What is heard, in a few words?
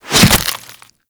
Barb Hit Flesh; Warframe; Desert Skate; Hit Effects